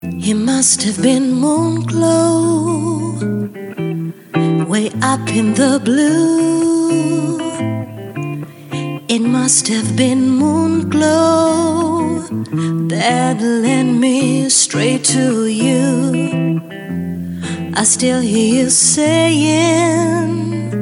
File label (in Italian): canto